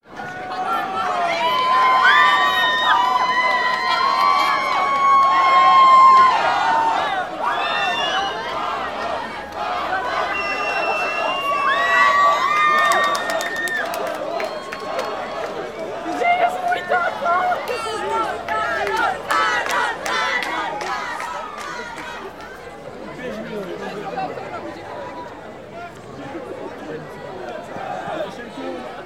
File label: Applause Fans